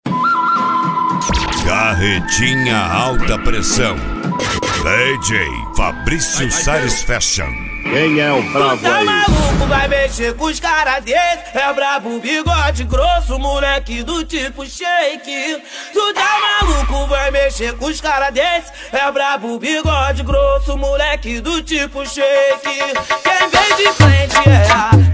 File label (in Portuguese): ritimada agressiva